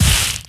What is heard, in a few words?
Ranged Magical Medium; Kilta